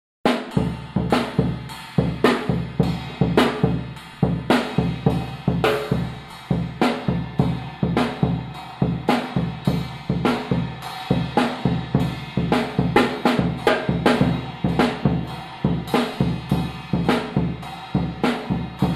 Batería

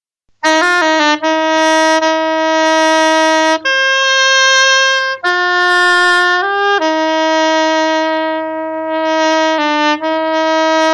Dulzaina

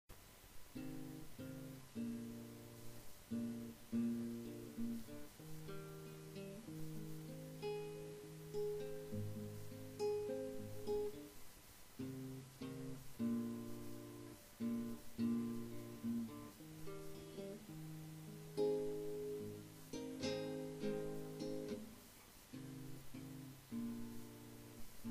BAJO BUENO, music

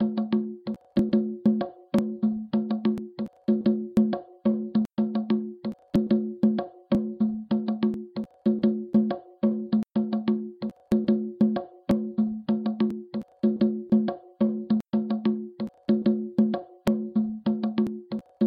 tres golpes

